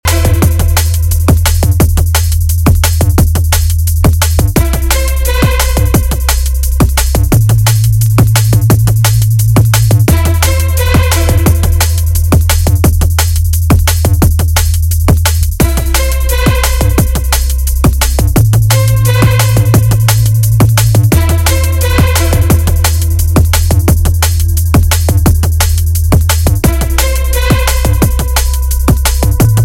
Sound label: Violin